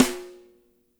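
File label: piccolo snare; Spectrasonics; Burning Grooves CD2; DRY KIT 4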